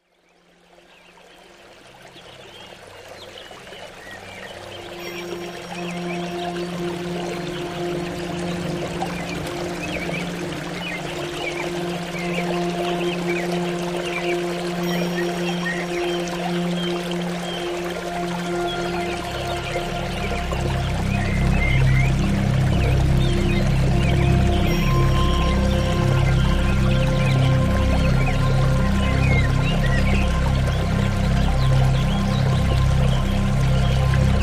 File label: stream